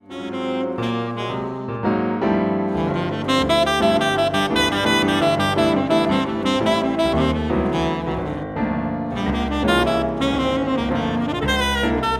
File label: Piano Sax